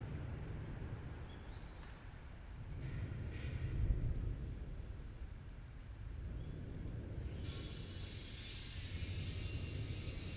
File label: yamanakako sound